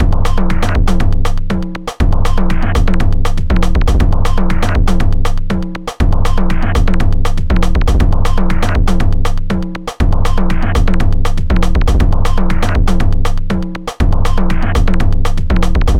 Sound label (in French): afro cuban électro, 240bpm